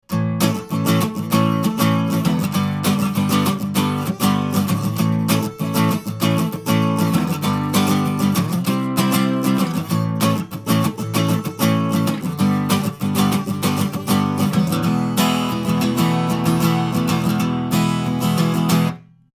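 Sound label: Schoeps CMC6MK2S Acoustic Guitar Strum 40cm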